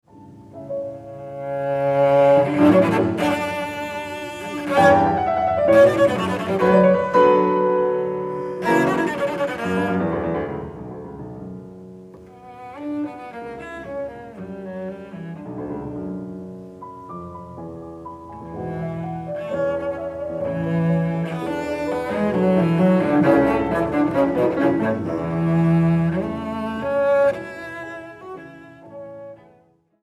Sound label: Cello Sonata in D Major Allegro con brio; Op. 102 No. 2; Beethoven